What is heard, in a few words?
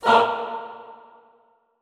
"fah", classical choir, A#2; Best Service; Extended Classical Choir